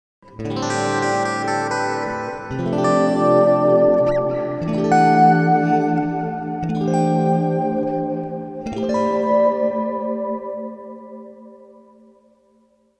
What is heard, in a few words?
plate